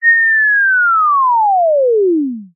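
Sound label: cartoon descend whistle falling tone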